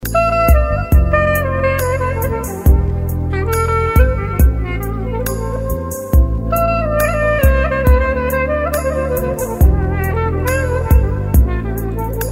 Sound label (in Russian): klarnet